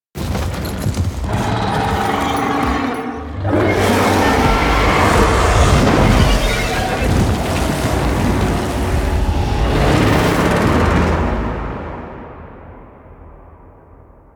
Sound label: Fire Death; Duviri Quest; Orowyrm